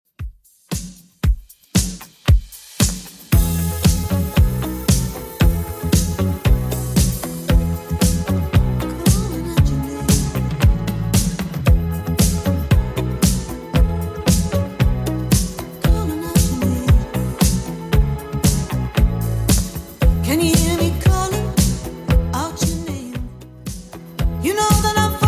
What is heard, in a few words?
80s 115 bpm; Redrum